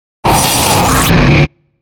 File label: Fast Airy Hiss Whisp; Blastwave